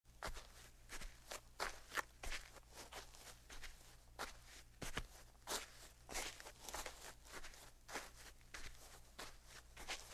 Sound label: Steps on dry sand in shoes